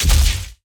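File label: Sci weapon Barrier Blast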